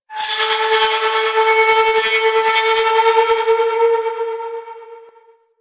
Metal Groans And Scrapes, Asteroid; Ambience; Warframe; Grineer Asteroid

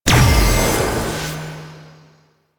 Healer Pulse; Warframe; Infested; Ancient; Healing Ancient

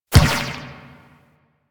Ball Bounce Dirt; Warframe; Missions; Tenno Ball

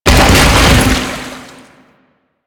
Bodyfall Water

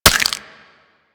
Infested Minion Bite; Warframe; Attacks

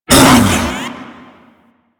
Ape Prelate Attack Short, Vocals, Enemies; Warframe; Proto Infested

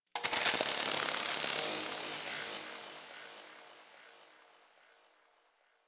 Ship Metal Movement Texture Small, Ambience; Warframe; Corpus Ship Remaster

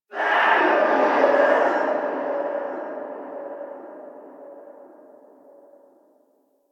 Stadium Infested Far

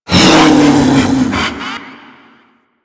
Ape Prelate Attack D; Enemies; Warframe; Proto Infested

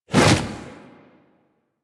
Edge Fist Swipe; Warframe; Warframes; Pacifist

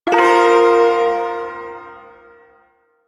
Ersatz Shawzin Blues Chord; Music; Chords

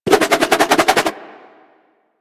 Nunchaku Quick Spin Whoosh; Warframe; Tenno

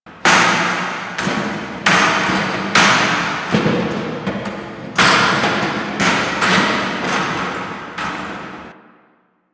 Cage Loop; Entrati Labs

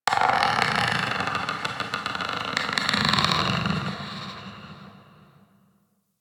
Forest Distant Tree Creak; Ambience; Warframe; Grineer Forest

